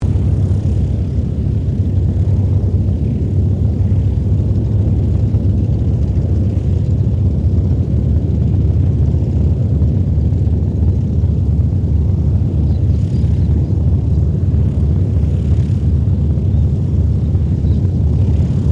Ceres Winjeel Wirraway idle, Mixed aircraft squadrons; Airplanes; Vehicles